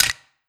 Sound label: Skel Foot Step